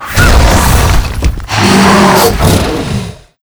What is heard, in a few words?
monster spwn; nixmonster